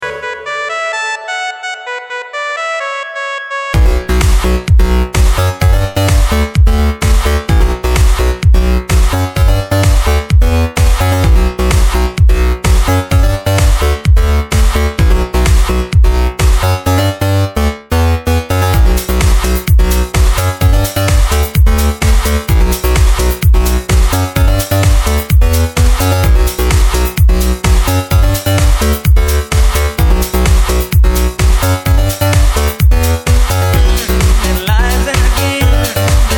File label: ring electro mix